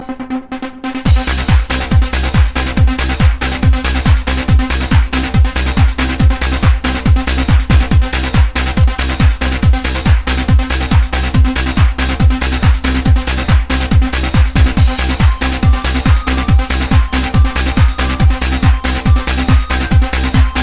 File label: Spin Ringtone